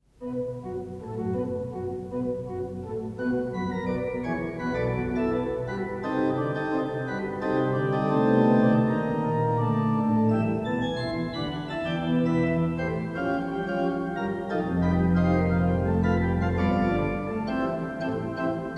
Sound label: Swing Bells; Albes